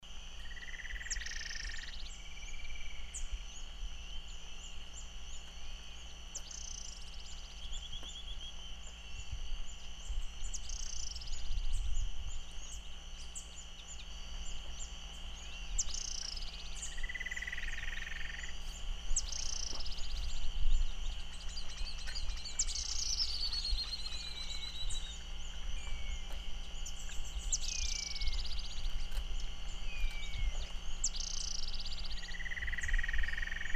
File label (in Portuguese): canto do balanca rabo guianense